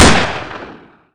fire, weapons; paranoia; aps

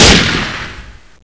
shot

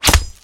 hit wall, knife; csgoknife

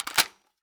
mp5 mag in

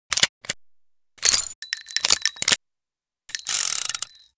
revolver reload

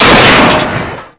zombie burst door

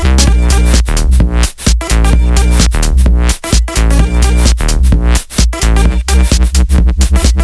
song

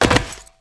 pl metal land, player

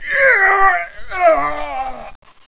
death

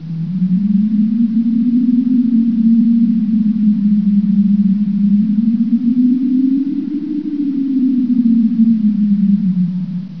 spooky

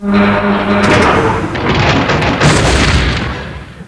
die

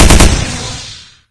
fire; synthsoldier

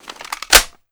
m16 mag in

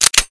slide back, weapons

